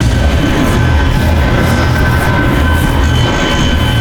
Chest Unjingle; foley

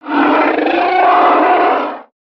dragon roar

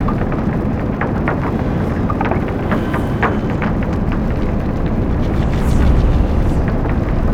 Maelstrom Drill

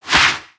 Light Whoosh; Valiant; aronwk collection; ndaudio

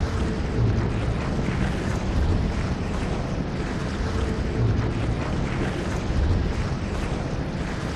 Pipes Loop; Imagination

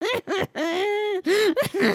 cry

